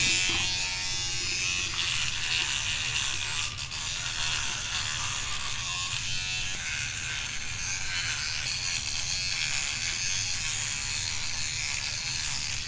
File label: toothbrush electric